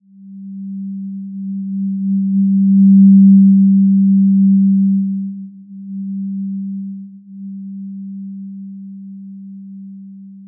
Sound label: Simple Glow G3 p; HSS-Custom-1; healing soundscapes